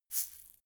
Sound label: shaker plastic egg hit single soft